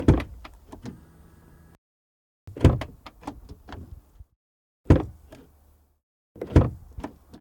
audi a4 allroad 20TFSI foley door open exterior; mono; NTG3; Soundholder

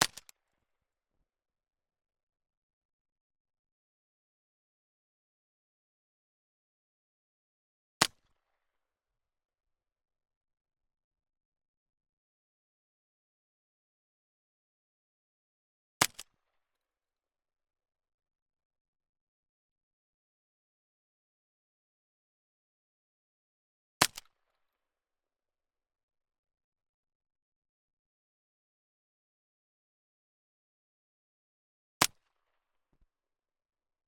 Beretta 1201F FIRING Close; DPA4062; Pole Position

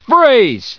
attack; conscript; hl2

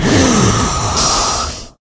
cremator die; hl2

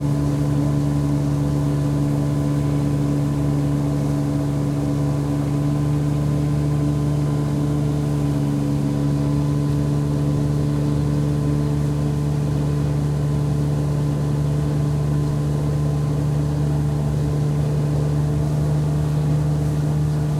motor boat ride; The Sound Pack Tree; The BIG Pack

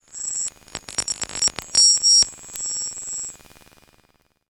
futuristic user interface data glitch; BluezoneCorp

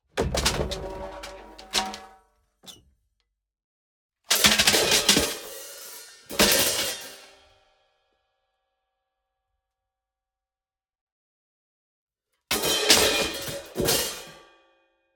EFX INT Crash Layer Drumset, Slapstick Comedy; Coll Anderson

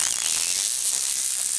battle talk; hl2; combot

